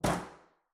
Metal Footfall; soundscrate

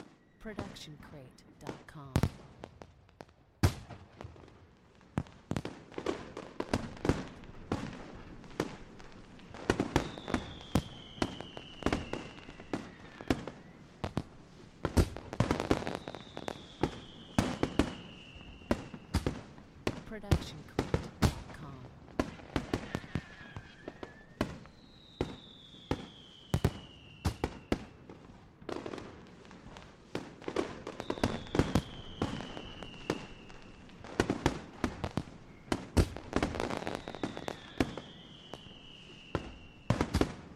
fireworks; soundscrate

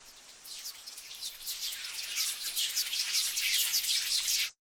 bats

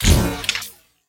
impact glitch; soundscrate

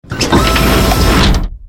Robotic Movement; SoundsCrate

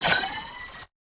Anime LFO Tail

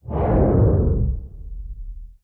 Fire Woosh; soundscrate

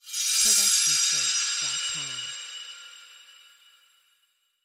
magical sheen; soundscrate

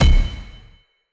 Epic Metal Hit; soundscrate

